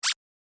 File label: Single Squeak Sound; Squeak Sound Effects